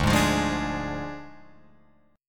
D Diminished D, Guitar, Open F; strummed

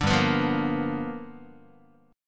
G 7th Sharp 9th G chord, Guitar; G7#9 strummed; JGuitar

